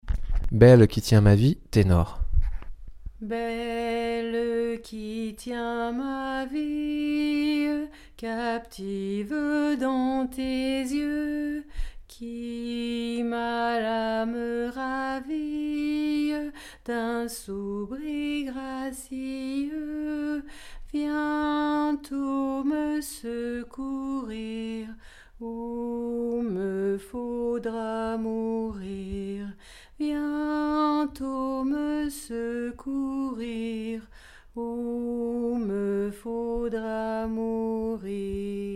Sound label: tenor; Belle qui tiens ma vie